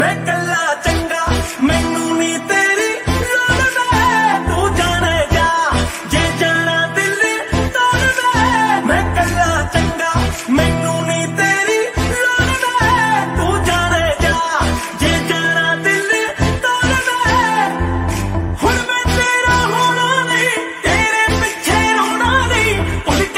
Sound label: sad ringtone, bollywood ringtones; whatsapp